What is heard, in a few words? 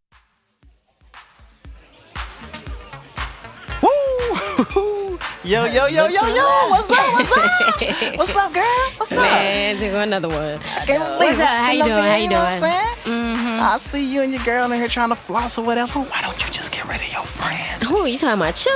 Interlude